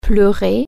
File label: cry